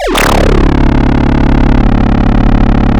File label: pop, chainsaw